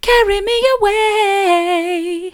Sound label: carry me away soft, vocal